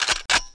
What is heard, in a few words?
dragunov; The Sum of All Fears